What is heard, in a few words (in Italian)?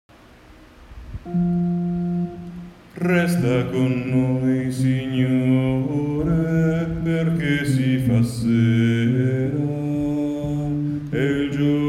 Resta Con Noi Signore Contralti